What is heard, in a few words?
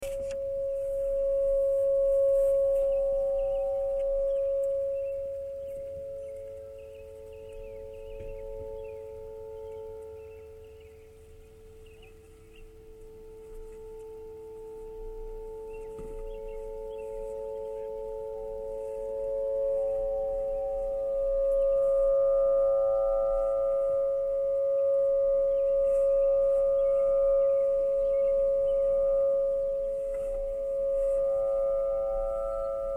table harp